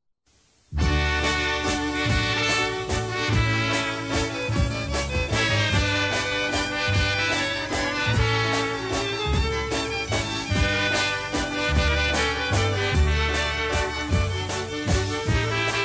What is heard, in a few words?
waltz